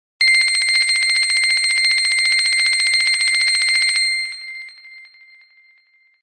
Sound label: Alarm; Pantech Pocket; alarms